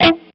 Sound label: bad note; Clone Hero